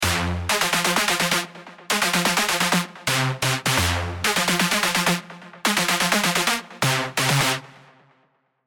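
F minor 128 bpm, Big Room & Progressive House MIDI Melody; Earthen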